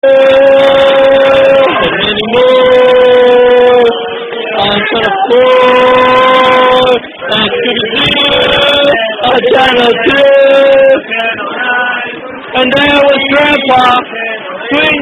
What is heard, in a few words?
Drinking Song With Spoken Introduction